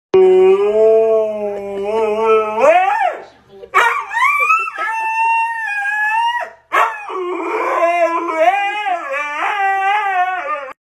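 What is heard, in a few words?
Husky, howl; زوزه هاسکی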